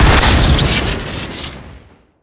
shoot crit, weapons; tf2; frontier justice

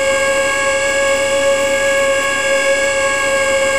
aa gun spin, weapons; tf2

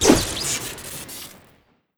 boxing gloves swing crit; tf2

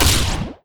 tesla needle fire; tf2

